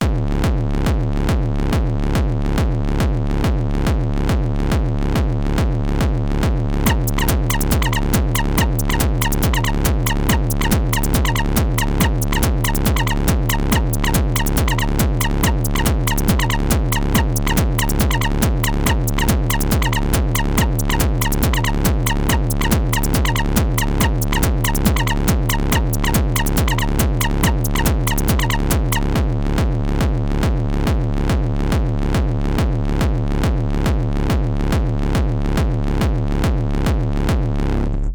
RAW Kick

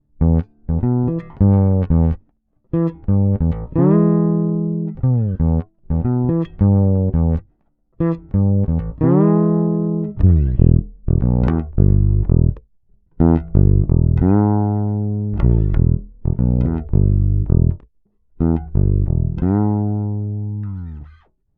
Fretless DI

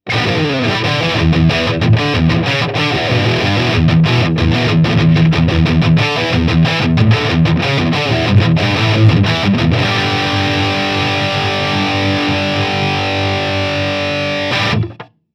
Heavy Crunch Heil PR40 Celection 65 Watt

